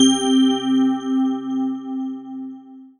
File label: Microwave; molecules-and-light